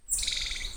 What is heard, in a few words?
mystax, Saguinus mystax